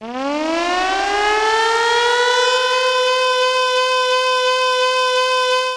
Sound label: ALARM; XXL 800 Production Effects